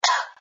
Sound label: Cough; Lewd SFX